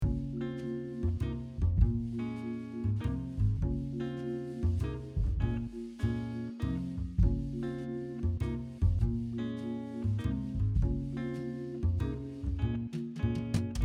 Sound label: Sad Thoughtful